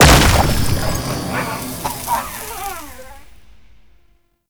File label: Shroom Proj Explode; Warframe; Nokko; Gyromitra